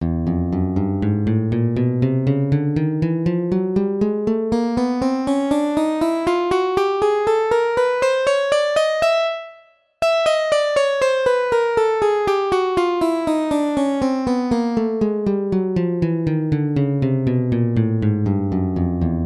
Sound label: chromatic scale practice